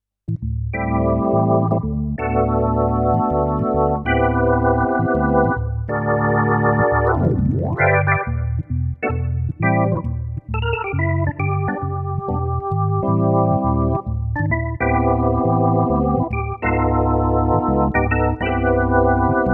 dexibell vivo sx-7 jazzorgan

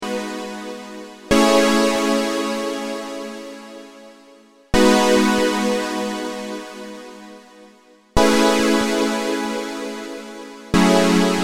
Bpm70 A Shore Synth; Night Call

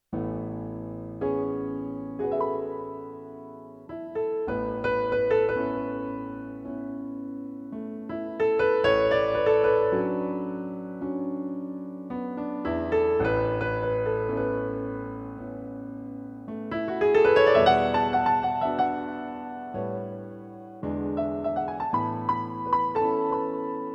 yamaha p-255

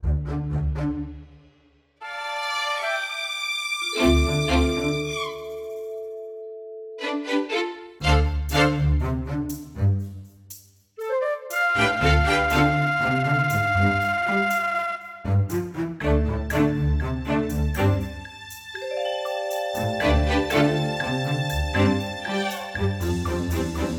symphonic orchestra; presonus; crime club

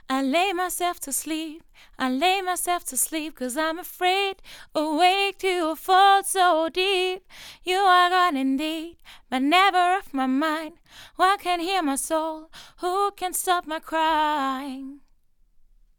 vocals; seelectronic se2200a

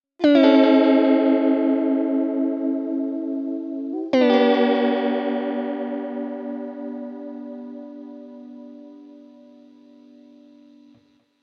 Korg SDD-3000 test wobbling; uad

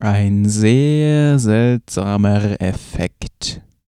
roland vt-3 lead dry

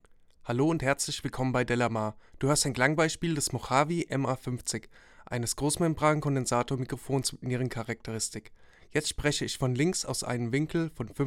mojave ma-50 vocal off axis

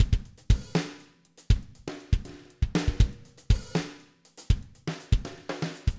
felt beater rods, drummer; toontrack superior drummer

